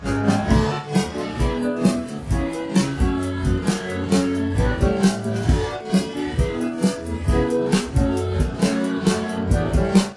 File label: piano drums; sound source separation; ADRess